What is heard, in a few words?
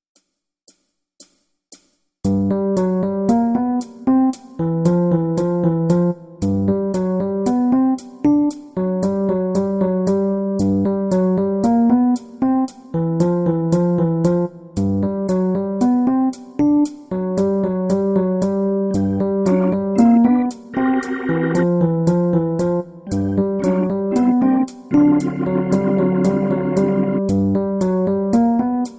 groove